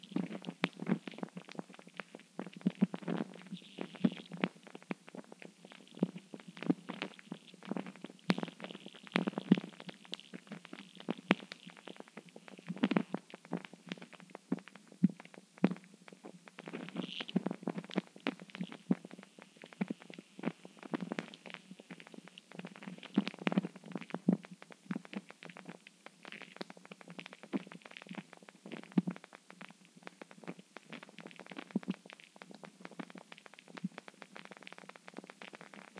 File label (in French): Fonte de la neige